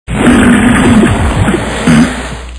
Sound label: pig jumping in water